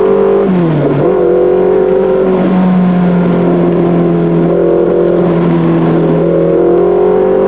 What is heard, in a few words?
race car